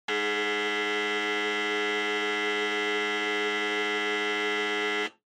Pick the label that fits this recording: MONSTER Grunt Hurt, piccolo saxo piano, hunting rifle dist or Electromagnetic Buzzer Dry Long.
Electromagnetic Buzzer Dry Long